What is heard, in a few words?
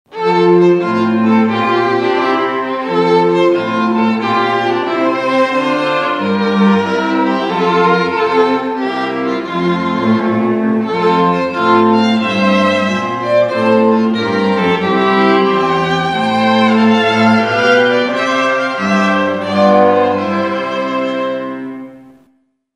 Water Music Air; Handel